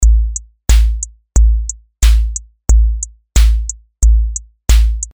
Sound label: drum machine